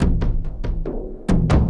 Bunka Dunka Dung, Bang Bang, Drums, Frame, Kendang, Short; Sonic Foundry (Sony Creative Software); Friendly Fire